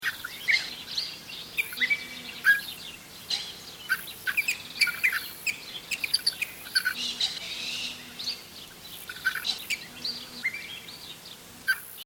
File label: scrambled Birds